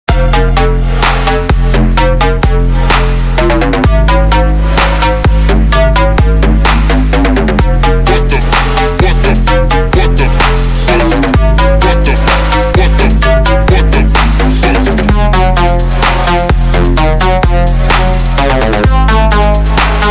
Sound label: edm trap